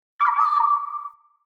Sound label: Mountain Forest Predatory Bird Far